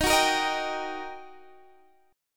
G Minor Major 7th Sharp 5th Eb

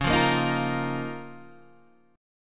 Db 6th Add 9th Db down Guitar Standard; Db6add9 strummed; JGuitar

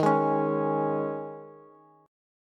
D sharp 7th Suspended 4th, Bass; D#7sus4 strummed; JGuitar

